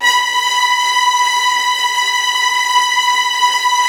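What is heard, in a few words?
STR TREMOLO, Virtuoso Strings, B3, right; ILIO; Kirk Hunter Virtuoso Strings VOL-3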